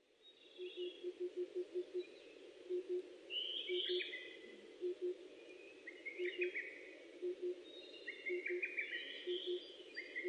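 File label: birdsong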